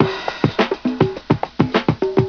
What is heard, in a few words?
beat